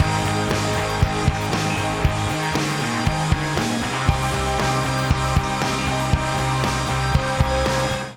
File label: Gtr Reamp Context